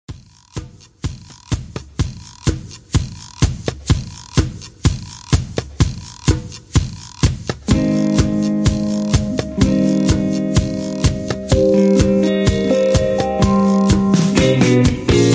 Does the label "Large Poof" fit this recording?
No